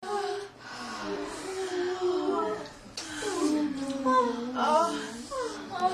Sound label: moans; The Witcher Hunt v.1011